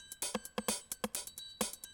130, Classic DrumLoops

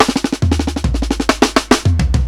105 FILL, DRUMS LOOPS; BASEMENT DRUMS VOL 2

